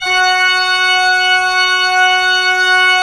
ORG, CHURCH ORGAN; Roland - LA Composer vol. 1

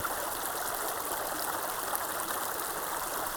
Waterway G Dark

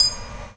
3D Overtone Synth; E-MU Producer Series Vol. 5 – 3-D Audio Collection; 3DSprints

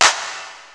W7 beat kit; Rubber Chicken Software Sample Bank

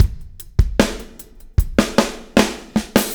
REV, REVEREND; Spectrasonics; Backbeat

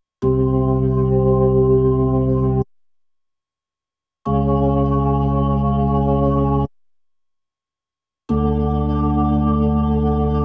B3 Organ; Masterbits CLIMAX 8

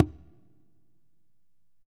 Congas wet, drums percussion

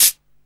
Cabasa, Alesis Hr16, Drums

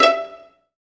Pizz E 3, STRING; Best Service Gigapack 1; STRING 2

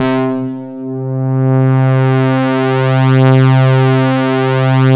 SYNH, SYNBRASS; Synthesizers

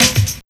ROCK 132, Big Beat Drumloops

